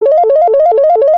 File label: Panasonic Ring